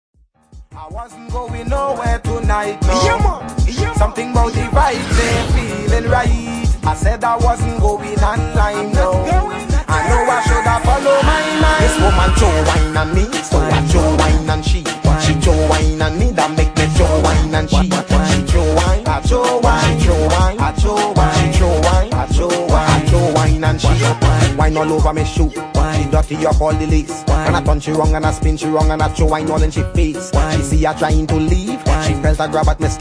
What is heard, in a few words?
Trinidad soca